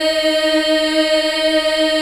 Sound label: D#3 Child EH, Choir; USB Soundscan vol.28